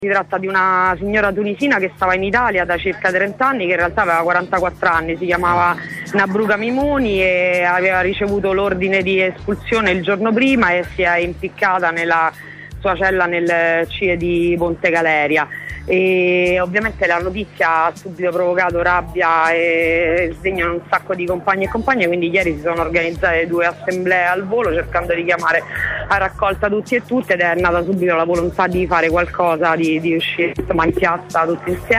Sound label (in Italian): corteo; SilenzioAssordante